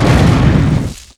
Bio Rifle Goo

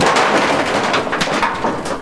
bust metal; gearbox; debris

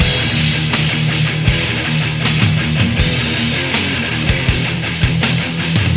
beat; svencoop; votemap

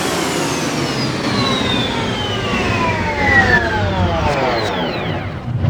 hovertank stop; svencoop

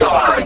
alert

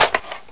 ak100 mag out; weapons; sa13

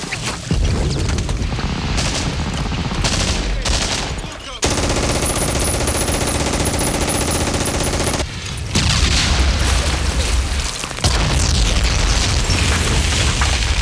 fight, ambience; dod

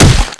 bullet hit; firearms

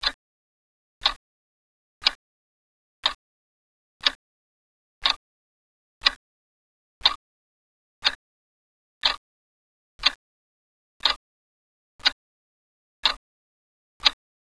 clock; svencoop

